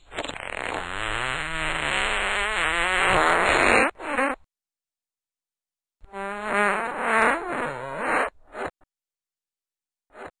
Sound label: fart sound